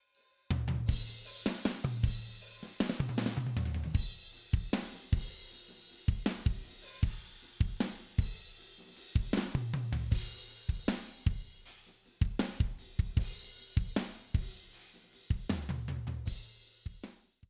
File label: conventional pan pot